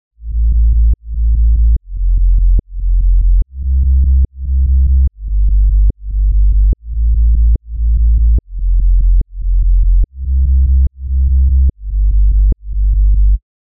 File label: Sub Bass